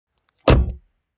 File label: car bmw e46 exterior door front close soft; Cars